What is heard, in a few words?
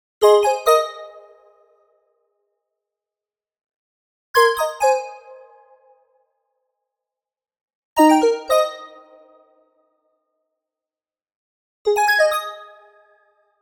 Arcade Jingle Positive; boom library; The Interface